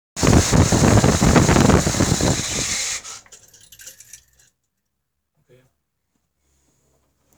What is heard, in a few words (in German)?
Ro80 ausschalten; bensch